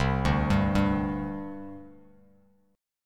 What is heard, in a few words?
C Suspended 2nd, C, down, Bass Standard; Csus2 arpeggiated; JGuitar